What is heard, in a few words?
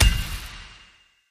spin Button Click; warlords